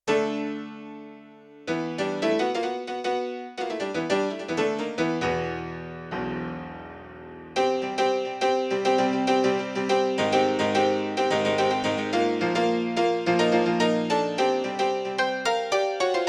Wide Fifths, Grand Piano; Yamaha TX16W; Yamaha CFIII Grand Piano

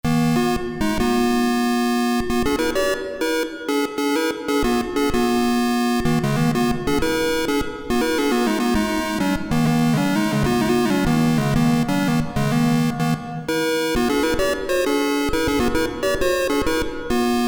5th synth, Davoli Davolisint

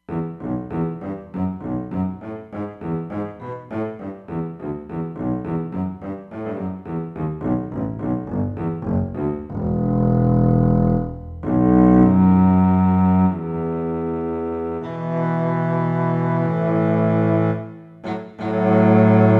Contrabass, Roland Fantom XR

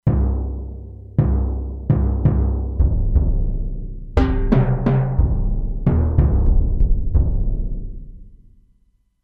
Deep Drum; Factory Presets; E-mu Proteus-2

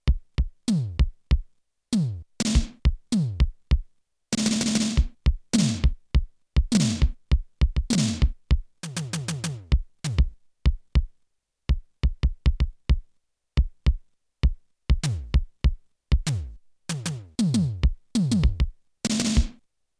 KIT ONE, Electronic Drums

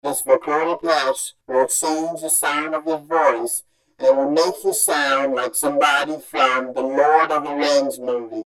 VoiceChanger, Korg MS2000; Factory Patches

